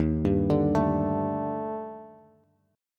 D sharp Major Sharp 11th D sharp Bass Bass Standard, chord; arpeggiated; 11,10,12,14; JGuitar